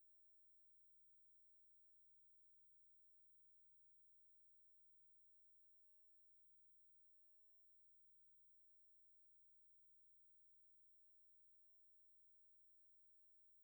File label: 145.475MHz; funcube